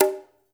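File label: brush conga, right; Spectrasonics; Liquid Grooves 1 & 2; CONGAS+BGOS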